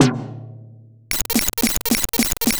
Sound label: RESO TOM, DRUM KIT; AKAI S-Series CD-ROM Sound Library VOL-4